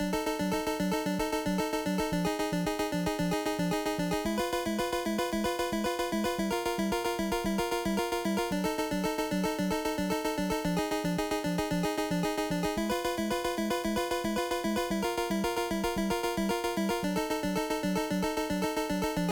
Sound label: Boss (Hurry Up!); super mario maker 2; SMB2